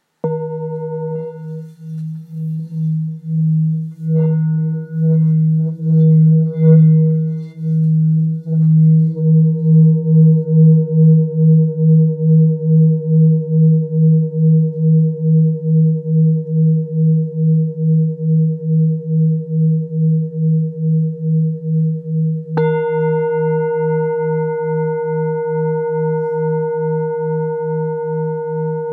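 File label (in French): Bengale la 1.6-1.7kg, Bol Chantant Tibétain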